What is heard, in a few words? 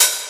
damped open hi hat b key